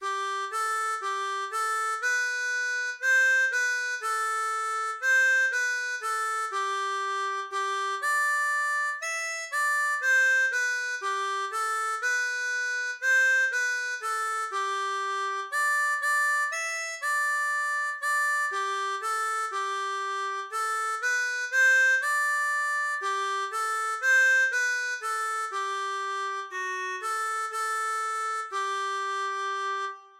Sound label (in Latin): Regina Caeli, harmonica; Religieux